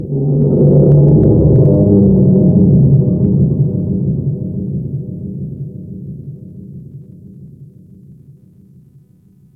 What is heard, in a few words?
Ocean Underwater Creatures Large; Warframe; Grineer Ocean